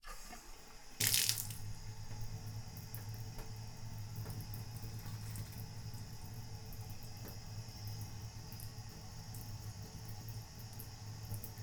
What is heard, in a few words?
bathtub; liquid; NS Studios - ultra sound collection